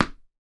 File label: sport tennis ball bounce wall or floor